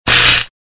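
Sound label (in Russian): звук электрошокера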